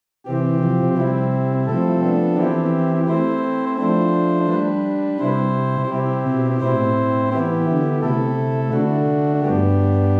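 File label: Great Open Diapason Large 8, organ stops; Hauptwerk; St Annes Moseley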